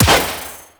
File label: Pistol Impact Generic; Warframe; Sentient; Crp Sent Amalg Pistol